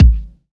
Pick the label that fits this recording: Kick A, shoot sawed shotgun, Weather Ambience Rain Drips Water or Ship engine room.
Kick A